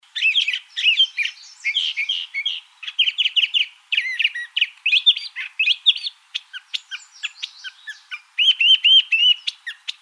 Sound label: CRTH, Mimids; AZFO; deviche